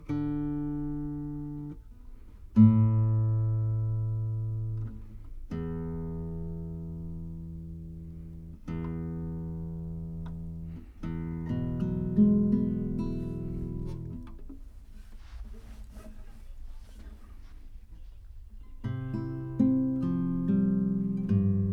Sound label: Tape GTR